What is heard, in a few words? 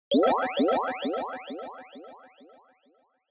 Single Accent Delay